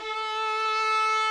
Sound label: oboe violin